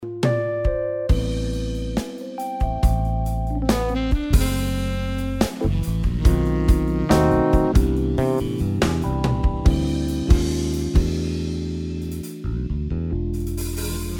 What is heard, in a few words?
smooth ballad